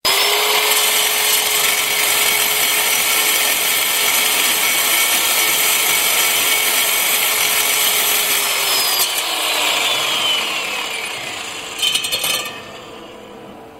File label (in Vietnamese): tiếng máy khoan rút lõi bê tông